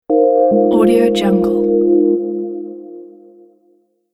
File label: logo signal radio